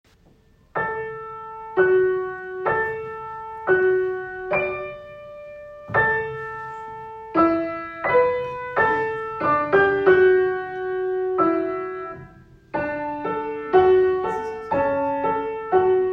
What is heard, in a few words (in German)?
Klavier; Home Alone; Adventskalender 2020; rostock